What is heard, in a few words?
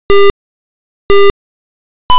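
OFFICAL IFSC START SIGNAL; ScStw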